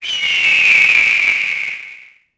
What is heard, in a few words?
hawk; Tony Hawk's Project 8; XBOX